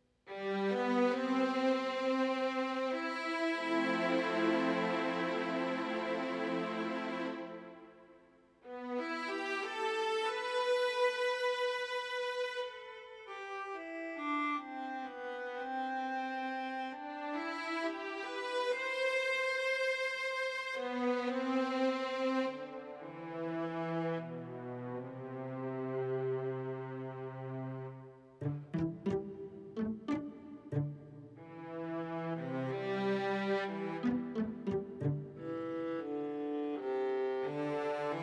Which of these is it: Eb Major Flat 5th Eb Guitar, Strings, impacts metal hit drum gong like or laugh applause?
Strings